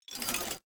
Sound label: environment gate up